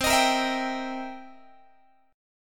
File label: C 7th Sharp 9th C Guitar; C7#9 strummed; x,20,18,20,20,18